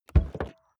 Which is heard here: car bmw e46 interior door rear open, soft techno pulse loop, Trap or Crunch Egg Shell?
car bmw e46 interior door rear open